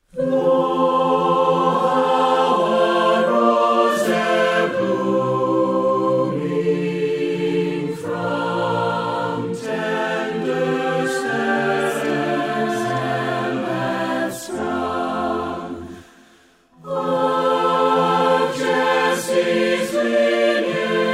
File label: melody, Choir; Lo, How a Rose E'er Blooming